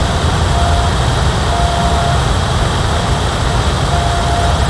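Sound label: turbine whine loop; machines mechanical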